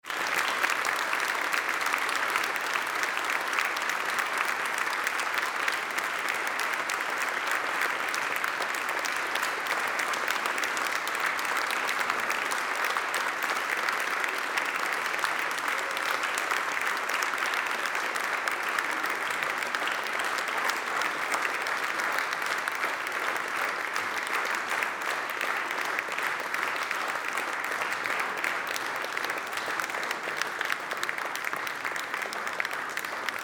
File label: applause